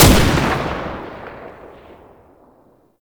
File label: Belt Fed Rifle Shot; Weapons; Warframe; Tenno; Frumentarius